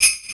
EQ Perc, Percussion Mix

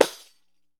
req slap; Deff Bendir &Req

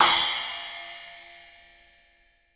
CYMBALS; 3D Sound; 3D Drums

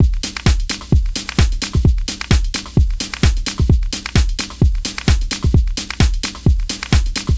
Full Loop 125, Loungin House; Full Loops 125; Equipped Music

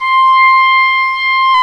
WND OBOE, Oboe Room; Roland L-CDX-03 Disk 1; Oboe 4-8

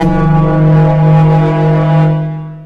CYBER KIT, DRUMS

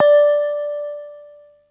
SY99 D4; SY99 YAM; East West; Global Sounds

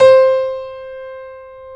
C4 PNO; CS PF-R

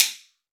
Shu Ban, Roland BK-5 Percussion

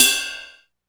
RID JAZZ, Korg EMX-1, Drum Machines

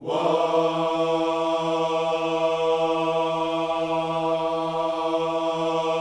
WHOA, Gospel, Vocal; WHOAS; ILIO; Vocal Planet

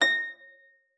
A6, HARP; Xsample VOL-8; HARP P+MF

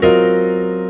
CHORD R, Drum & Bass 140 BPM; Ueberschall; Drum & Bass Flash Trackz

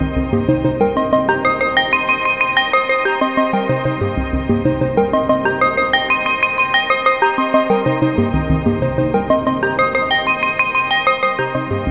SEQ KORG; AMG - Now CD-ROM (Roland); Old Gold; Old Gold SYN

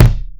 Kick, Drums

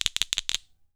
ILI ILI, HAWAII PERC; AKAI S6000 CD-ROM; WorldSounds

